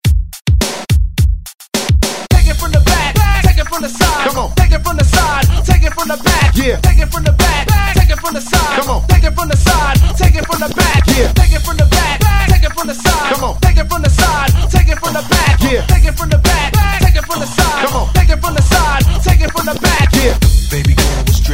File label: ReDrum